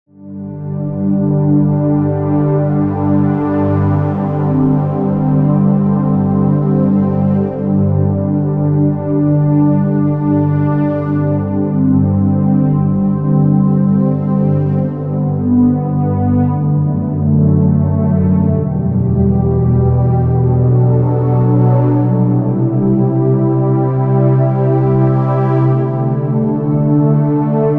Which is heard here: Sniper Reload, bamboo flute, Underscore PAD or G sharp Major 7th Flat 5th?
Underscore PAD